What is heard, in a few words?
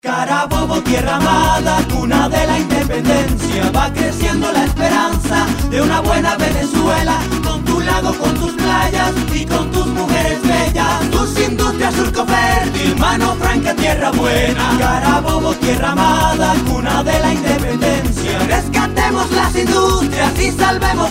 carabobo jingle; gobernador